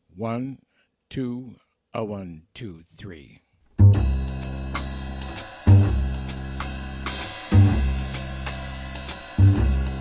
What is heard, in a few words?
minus piano; there is a separate piano part; count off sets up the intro